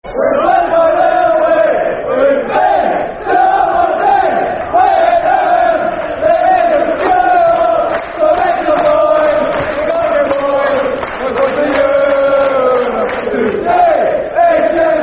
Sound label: We're Going To Europe Football Chant (Soccer Song); Heart Of Midlothian, Hearts Hfc